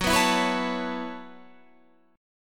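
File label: B 7th Suspended 4th G flat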